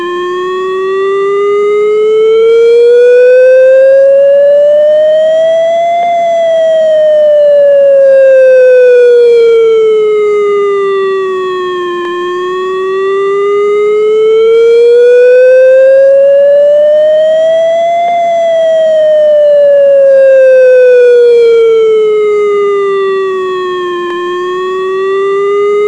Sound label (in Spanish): Alerta hasiera Comienzo de alerta, sirenas; alerta riesgo quimico y sirenas